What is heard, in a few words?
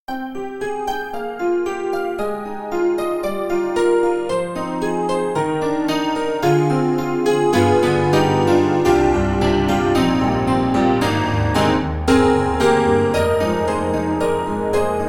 KORG KRONOS 2 Piano